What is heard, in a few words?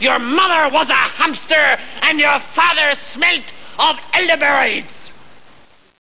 ffart